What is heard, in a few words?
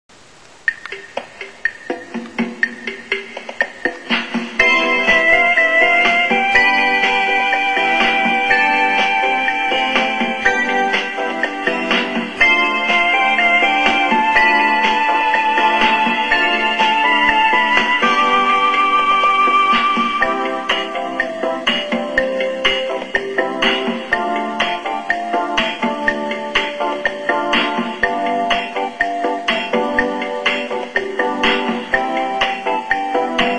music